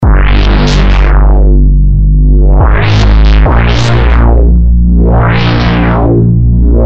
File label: Bass